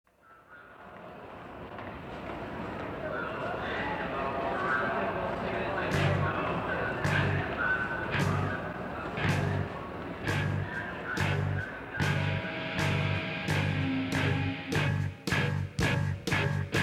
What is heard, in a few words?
Engine Rail